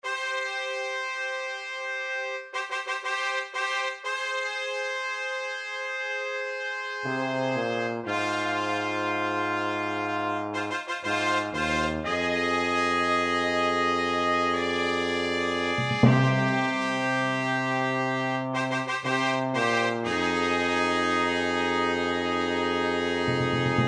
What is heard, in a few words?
fanfare